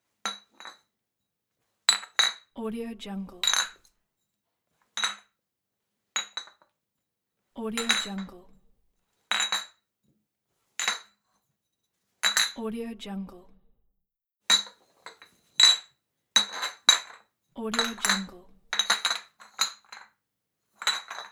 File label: glass bottles into bag